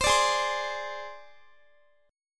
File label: G sharp Major Flat 5th, Guitar; G#Mb5 Chord